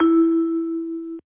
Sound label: key